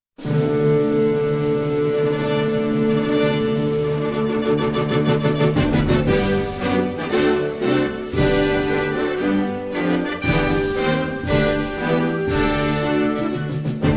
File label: anthem